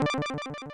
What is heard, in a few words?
Delay